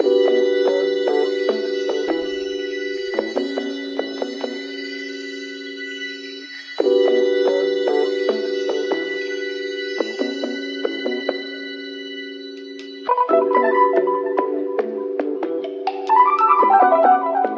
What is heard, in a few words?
keys; Phonky